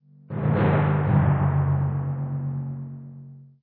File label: Tymp Hits; Lichdom: Battlemage